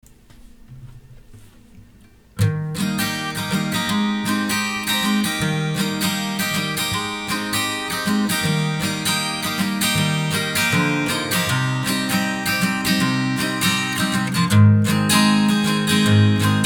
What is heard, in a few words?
carefree melody